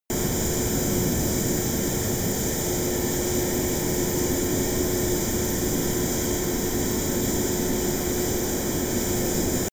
military jet fa-18 hornet turbine full power direct